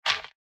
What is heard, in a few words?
footfall gravel step; soundscrate